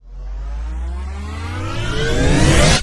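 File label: Reverse Spin Up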